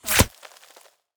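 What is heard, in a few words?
bullet shot land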